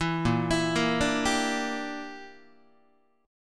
Bb 6th Flat 5th Guitar, chord; Bb6b5 arpeggiated

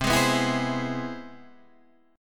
C Augmented Major 7th, Guitar, Standard; strummed; JGuitar

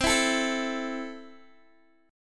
C 6th, C, down, Guitar, Open G

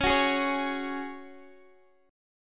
C sharp Suspended 4th, Guitar, Open D

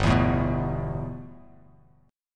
G 6th Add 9th G Bass Standard, chord; G6add9 strummed

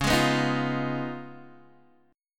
C sharp 9th, C sharp, down, Guitar, DADGAD

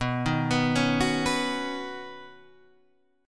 B Major Add 9th B, chord; Badd9; arpeggiated; JGuitar

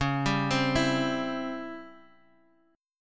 Db Minor Major 7th Flat 5th Db Guitar Open G

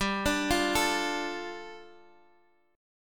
G 7th Suspended 2nd G, Guitar Drop D; 17,17,15,14,x,x; down

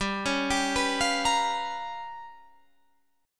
G 7th Sharp 9th Flat 5th G, Guitar Half Step Down

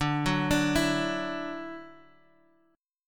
D-Major Add 9th D down Guitar Half Step Down

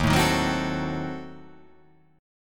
D sharp Diminished 7th D sharp

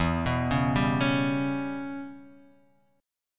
E Minor 6th, Guitar, Half Step Down

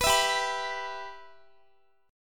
G 6th, Guitar; G6 strummed; x,x,21,21,19,18

